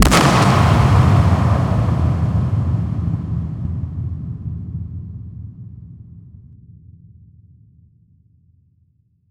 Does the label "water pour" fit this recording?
No